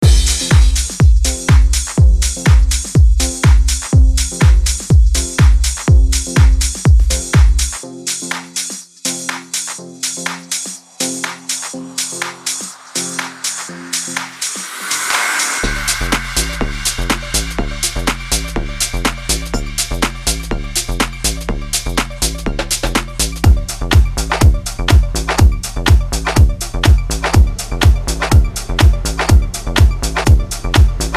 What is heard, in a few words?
house and deep house drums and fx; Papa Records